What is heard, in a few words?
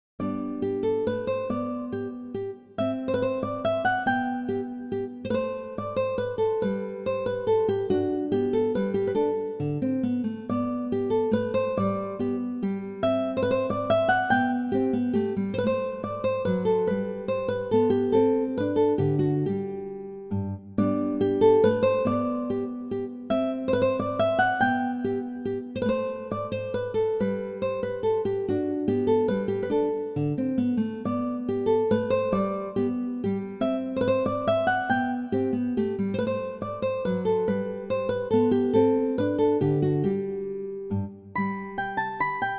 menuet